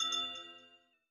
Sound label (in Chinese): 紫水晶共鸣声